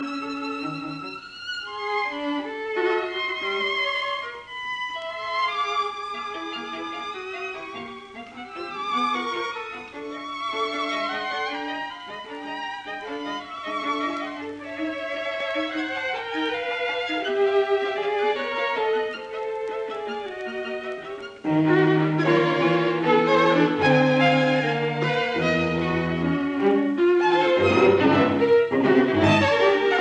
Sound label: String Quartet Rondo Molto moderato; Schoenberg